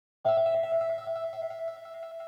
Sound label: Acoustic Vibes